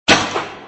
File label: cane impact